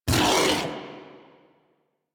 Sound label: Feral Vocal Angry Attack Short; Warframe; Kubrow